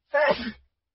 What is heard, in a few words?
Sneezing; Yoongi